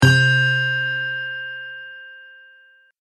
Ding Bold Chime Sound; Bell Sound Effects; musical

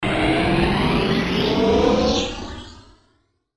Power Up Distortion Ascend; Rise And Dive; Blastwave

